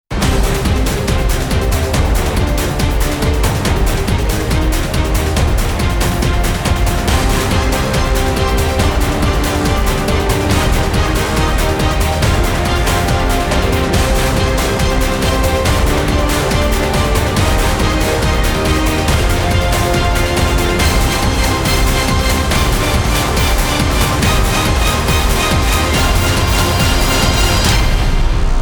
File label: No Choir; трейлерная музыка